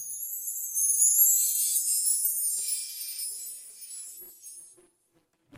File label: chimes